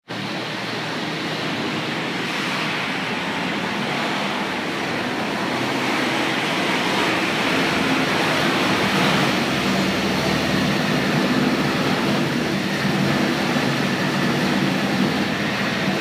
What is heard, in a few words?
Sixth Floor Drilling